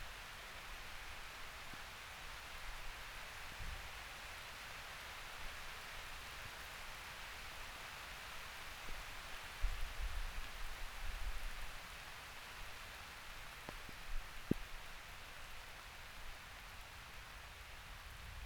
heavy rain shower heard from under sycamore tree near front of